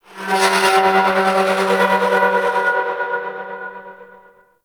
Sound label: Metal Groans And Scrapes, Asteroid; Warframe; Grineer